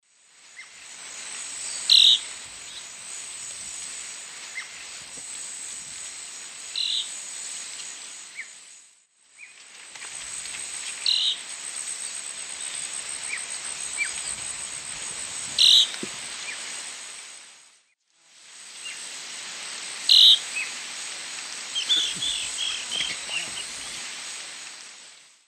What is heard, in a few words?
Elaenia gigas, call and song; S. America; Eastern Lowlands